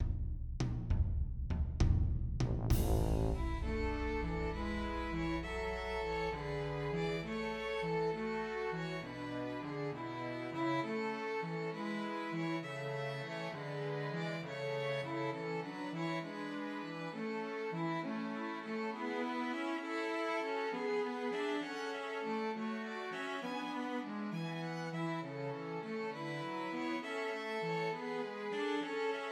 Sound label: Christmas Trios for Two Violins and Cello; I Saw Three Ships